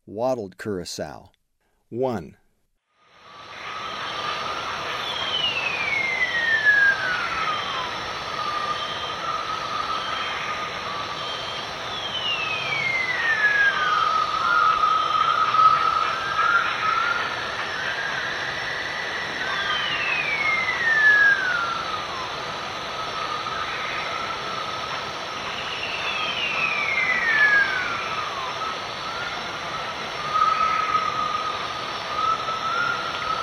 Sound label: Wattled Currasow, Birds of Ecuador; S. America